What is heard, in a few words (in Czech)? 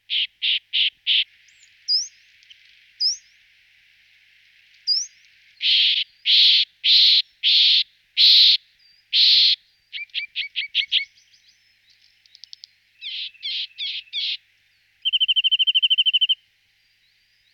Brhlik korsicky Sitta whiteheadi